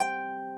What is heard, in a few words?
harp